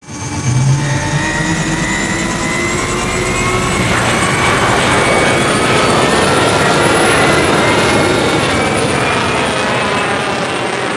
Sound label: Race Mode Ship Depart; Space Battles